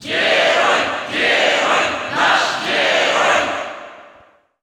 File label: Cheer Russian; Hero; SSBU